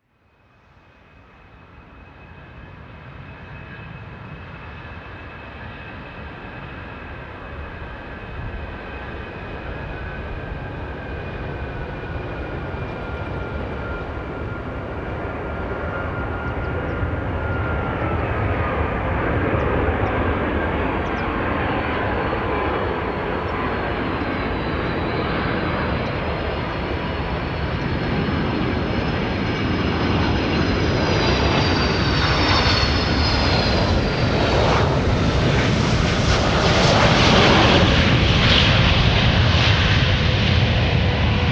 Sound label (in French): avion ligne atterrissage